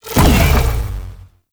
Zanuka Companion Stomp Burst; Warframe; Characters; Abilities

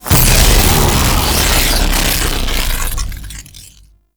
Lightning Stomp Land; Warframe; The New War; Archon; Boreal; Abilities

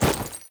Temple Generic Footstep; Warframe; Foley

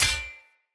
Short Sword Impact Parry Sword

